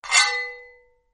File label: Sound of metal